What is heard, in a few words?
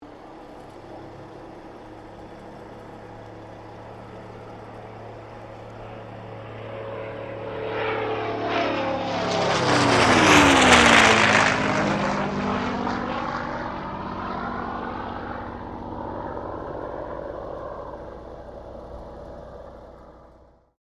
AIRCRAFT CAC CA-18 MUSTANG MK23 PASS OVERHEAD; CA-18 /P-51 Mustang; Airplanes; Vehicles; Aircraft Collection; Twistedtracks